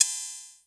HH Open, Vermona DRM1-MK2 Hi Hat

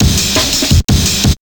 Amen; Amen-tal

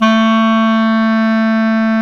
CLAR, Clar Jazz; Clarinets; Roland L-CDX-03 Disk 1

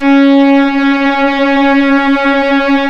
C#, strings; Big Fish Audio

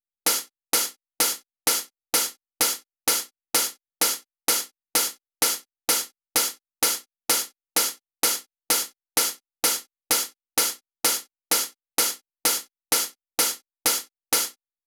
128BPM HH; Essential Clubsounds Vol.5; Let's Work It Kit; Root F#min; Drums & Perc

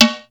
COW BELL LO, RY-30; Hollywood Edge; The Big Bad Beatmachine

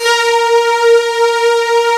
BIG BUZ B3, SYNTHS; Kid Nepro - Elite

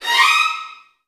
VN FX, Virtuoso Strings

